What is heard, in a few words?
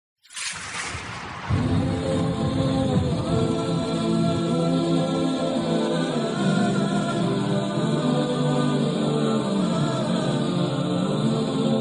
Vocals Only, nasheed